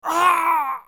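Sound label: sounds of human suffering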